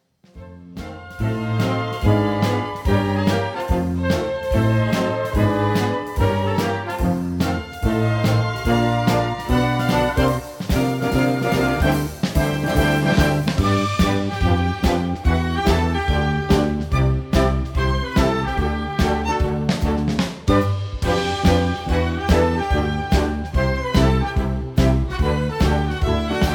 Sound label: Instrumental